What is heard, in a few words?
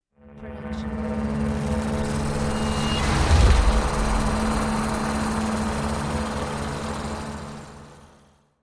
dark magical energy; soundscrate